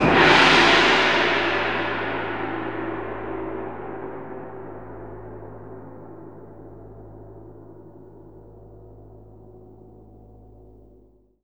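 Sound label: orchestra; Masterbits - Switch FX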